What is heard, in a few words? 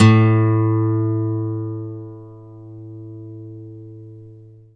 6 Stg Steel Gtr A1, six string steel guitar